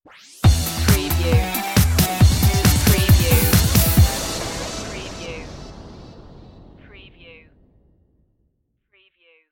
digital kutz stinger

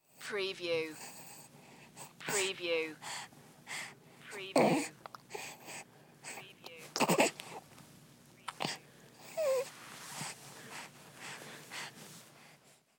Baby Breathing; sound effects bible

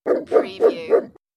Big Dog Barking

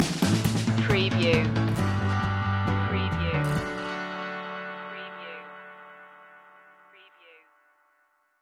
rainbow pop stinger

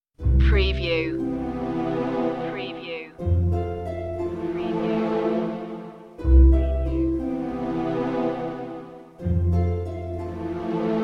strings only

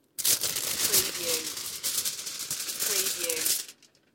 Metal foil, aluminium foil open

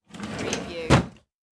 Drawer Open; Sound Effects Bible

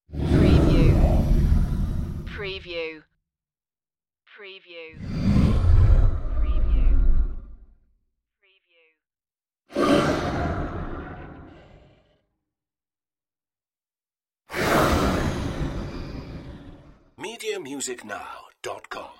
deep monster breath

